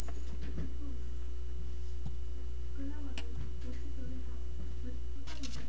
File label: hit